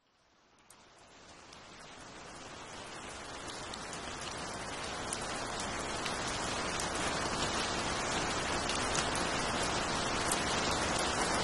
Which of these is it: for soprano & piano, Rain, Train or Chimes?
Rain